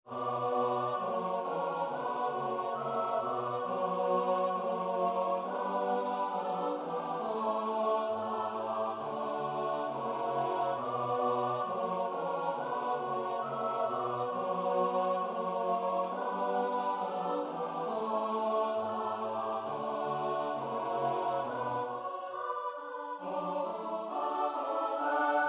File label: midi; oto baranek bozy